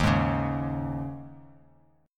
Eb 7th Suspended 4th; Eb7sus4; strummed; x,6,6,6; JGuitar

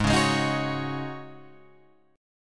G sharp 7th Suspended 4th G sharp, Guitar Open F; G#7sus4 strummed; JGuitar

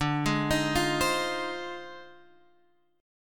F Minor 7th D; JGuitar

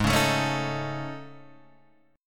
A Major Sharp 11th, Ab

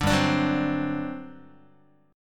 G Major 7th Suspended 4th, G, down, Guitar; GM7sus4; strummed

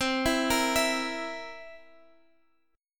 B sharp 7th B sharp; B#7; arpeggiated

